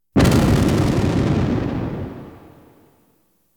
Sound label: Science Fiction effects, Lasers, Light beams